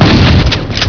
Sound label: SHOTGUN